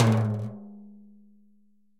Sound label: Timb B L Pressroll, Timbales; Latin Percussion; Drum Hits; Ableton; Factory Packs